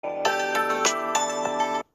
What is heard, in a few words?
samsung galaxy s10 bellboy